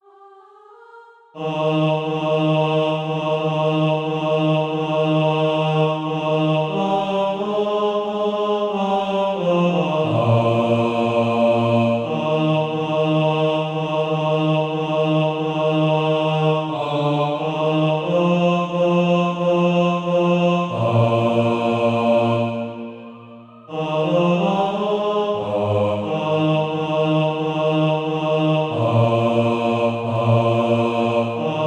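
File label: bass, SATB Practise Music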